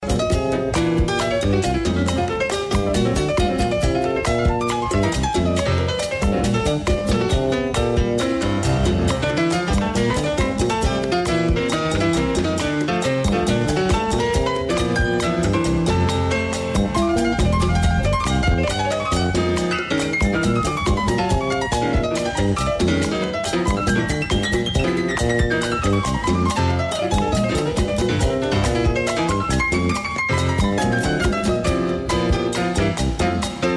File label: Jazz